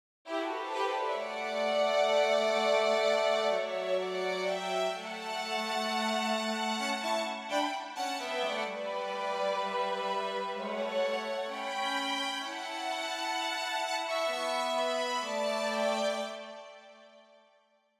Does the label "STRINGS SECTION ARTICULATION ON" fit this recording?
Yes